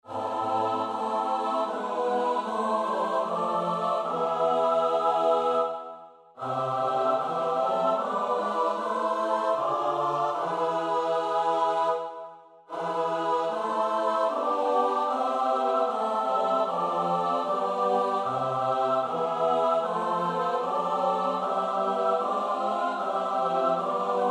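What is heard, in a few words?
soprano alto tenor bass; Bach (Johann Sebastiaan); BWV 188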